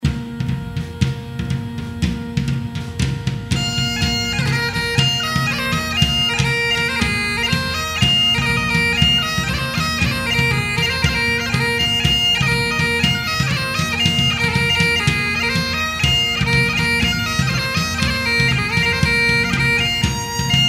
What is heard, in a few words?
tune, bagpipe; Mcgregors